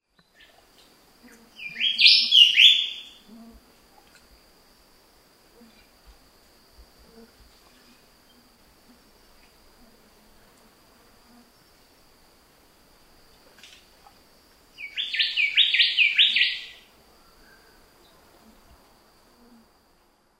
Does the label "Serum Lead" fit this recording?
No